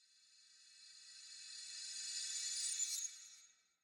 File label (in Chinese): 诡异森林末影声; ambient